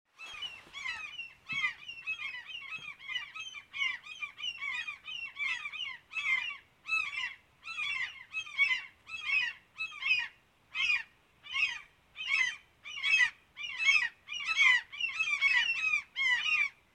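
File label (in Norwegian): måker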